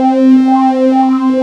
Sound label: LFO WAVE